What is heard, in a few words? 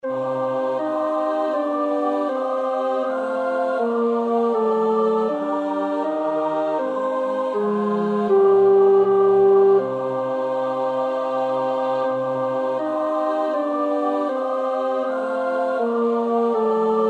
tenor; Bach (Johann Sebastiaan); BWV 073; Das ist des Vaters Wille